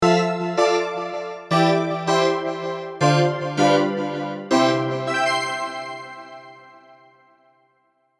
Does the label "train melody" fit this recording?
Yes